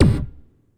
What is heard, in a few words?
Gated Kick, Gated Reverb; Univerb; musicradar